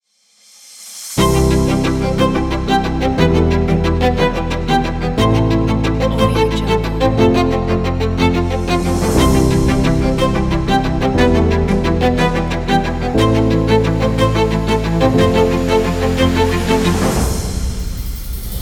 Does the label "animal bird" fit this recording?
No